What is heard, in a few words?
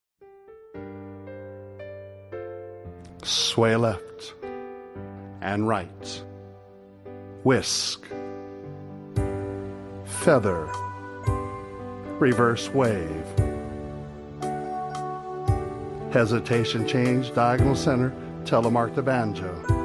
Instrumental Rhumba